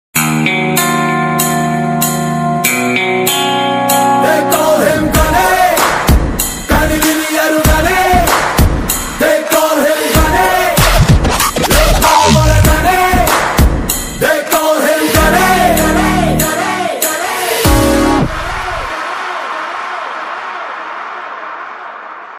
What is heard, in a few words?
anthem song bgm ringtone movie bgm telugu bgm ringtones; Ghani